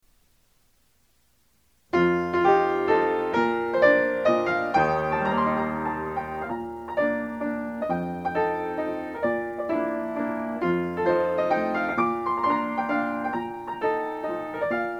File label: mazurkas